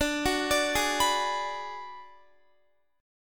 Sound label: D 6th Flat 5th, Guitar; D6b5 arpeggiated; x,17,18,19,20,19